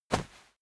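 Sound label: step walk textile medium, footsteps; schritte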